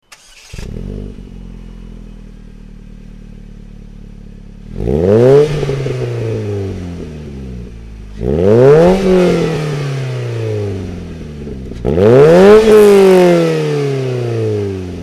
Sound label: magnaflow exhaust systems